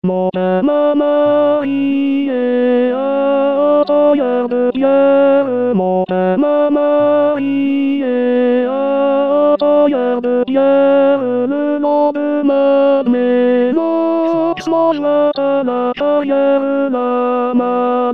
deja mal mariee tenor; 2026 saint jean

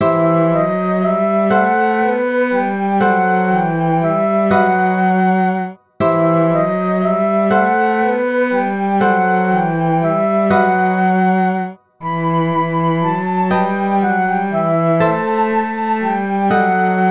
menuet

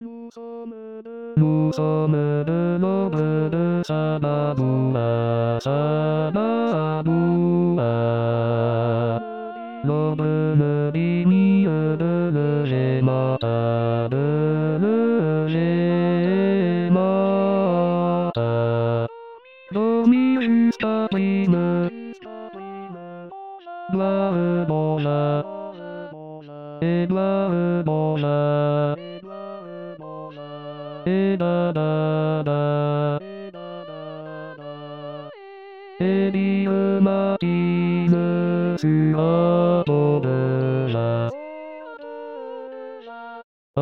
basse; saint babouyn

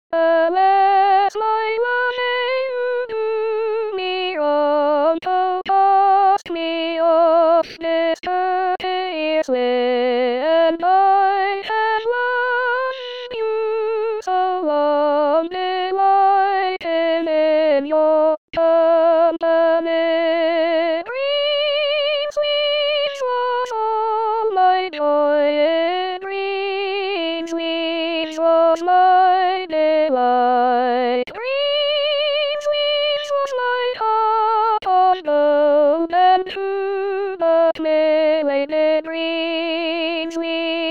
sopran; greensleeves; rimailleurs

